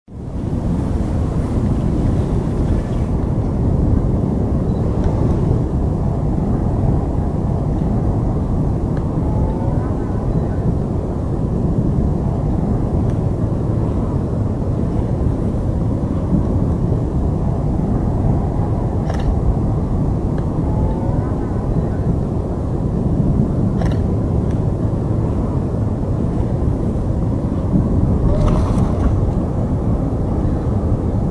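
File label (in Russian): звук торнадо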